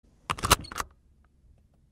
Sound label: seatbelt on sound; asperV1FiveM; esx addons; esx hud